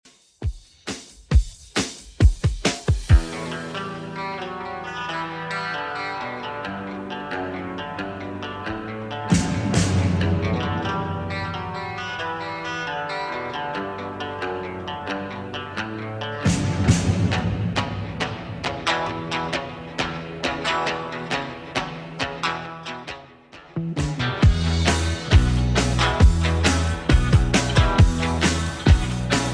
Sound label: 70s Redrum 135bpm